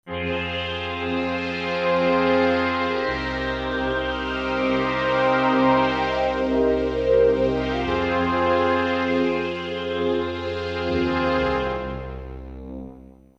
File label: strings; werobots; southpole